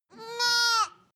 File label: goat lamb baa close